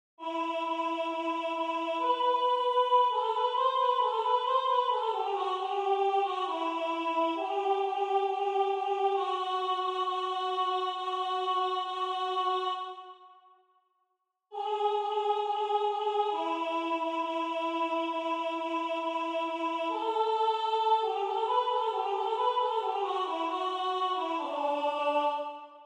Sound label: Alto; Antonio Vivaldi; Gloria